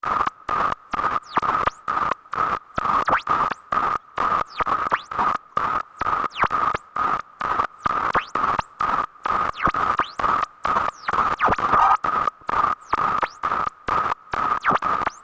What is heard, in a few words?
mirror music